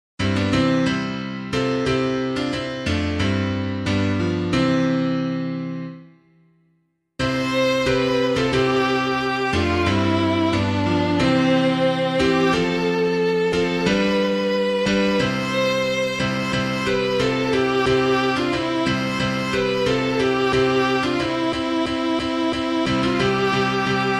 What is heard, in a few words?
piano